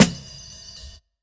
Rich Top End Snare Drum Sample C Key, acoustic snare tuned to the C note